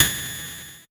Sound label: SYNTH CYMBAL; Best Service Alpha Dance vol.2